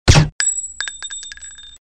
silenced gun sound

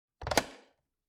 open doors sound effect

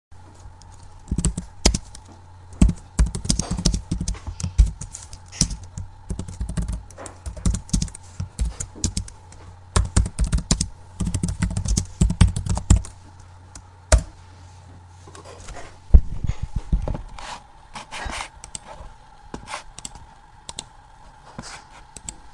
laptop key board noises

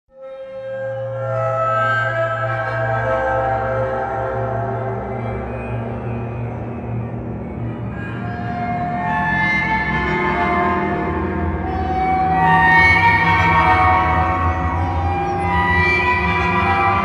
scary horror background ringtone

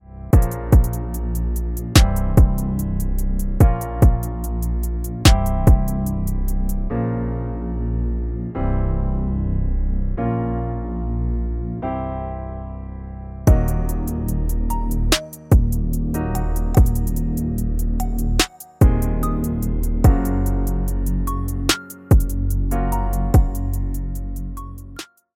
sad emotional music

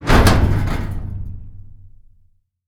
metal door hinge

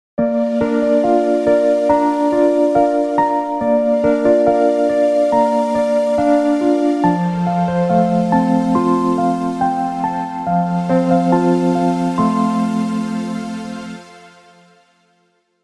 morning alarm piano

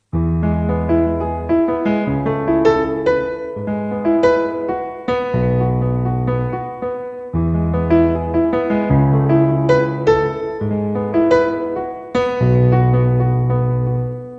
love night piano ringtone

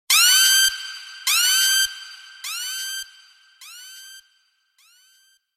trap siren sound effect

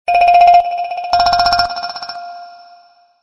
message received

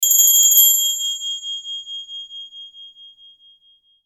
small bell ringtone

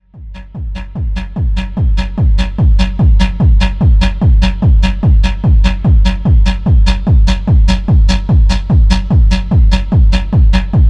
electronic techno ringtone

